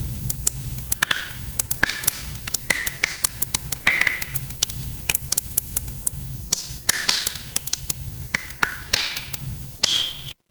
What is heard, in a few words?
ambience fireplace